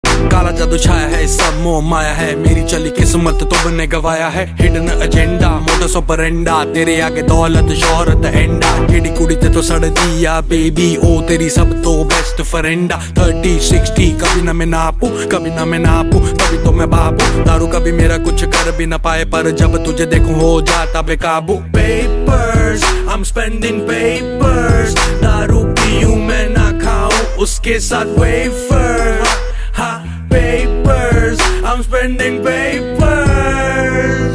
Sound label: Song Rap Verse